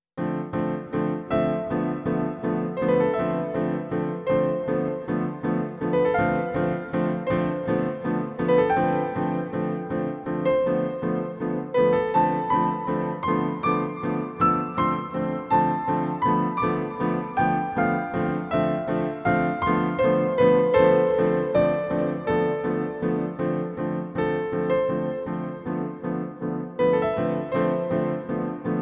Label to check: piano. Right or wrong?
Right